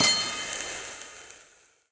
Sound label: move walking wild add; netent; narcos